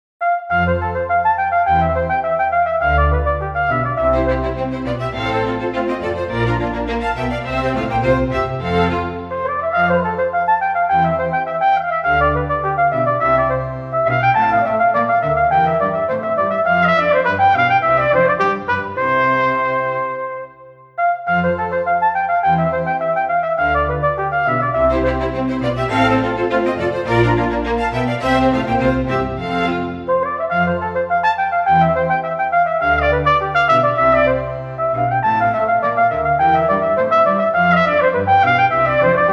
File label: Sonata in F Flugelhorn; Albinoni; VSL